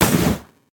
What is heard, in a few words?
gun